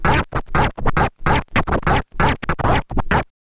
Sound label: scratch kit, vinyl